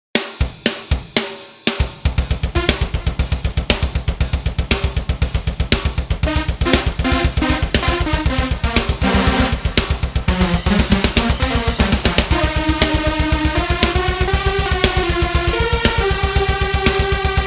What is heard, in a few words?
trance ezdrummer